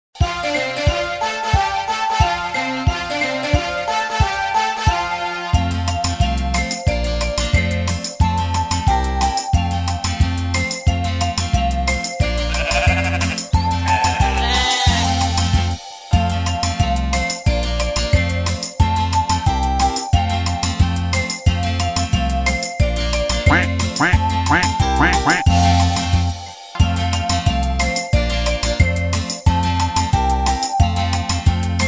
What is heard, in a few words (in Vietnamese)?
karaoke